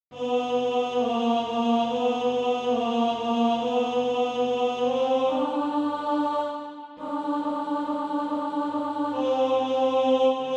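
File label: alto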